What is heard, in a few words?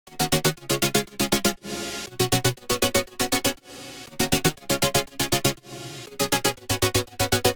Chords; Remixlive; Dancefloor Pop